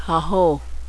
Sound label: hahoo